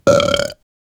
stomach

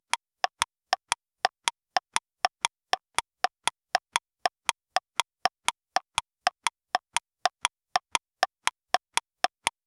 realistic isolated sound, step

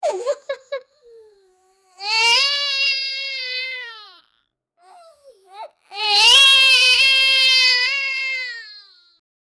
child crying; unhappy

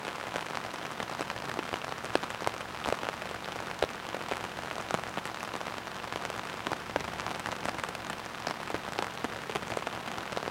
soft rain ambience heard; raindrops

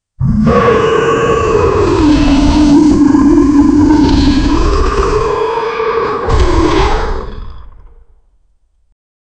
a roar that sounds like, lion

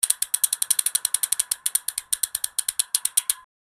the bolt of an mp5; machine gun

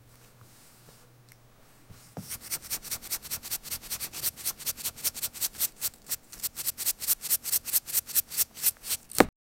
smile, amusement

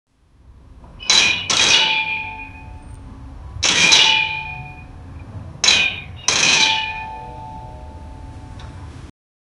metal machine brakes; stop

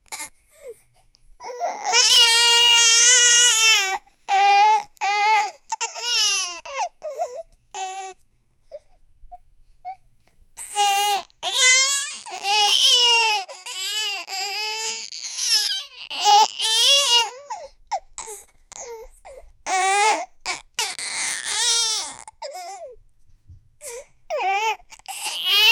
baby toddler was crying

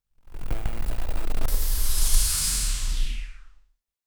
sci fi zap glow

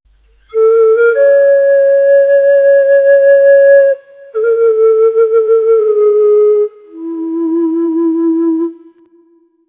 slow emotional indian bam; rural

